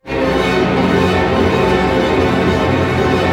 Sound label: ARP C, STR ARP DIM; Sound On Sound - Peter Siedleczek's Orchestra